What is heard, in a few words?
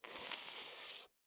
Foley Tire Skid On Cement